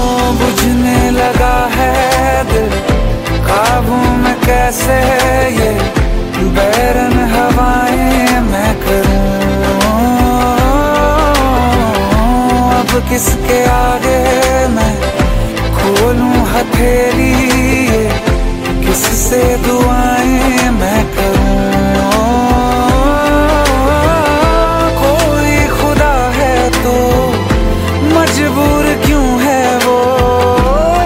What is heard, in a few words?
Sad Hindi Song Ring